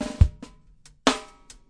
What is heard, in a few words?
140 bpm, kick and ghost snare, drum loops and breaks